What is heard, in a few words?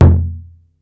wataiko, Yamaha Motif ES drum machine; 300 Drum Machines; Yamaha Motif ES-Series